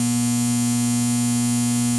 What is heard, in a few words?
Substation 60Hz High Votage Transformer Sound